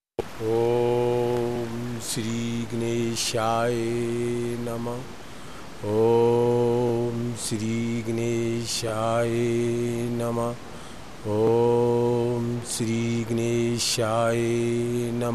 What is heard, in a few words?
Mantra Ganesh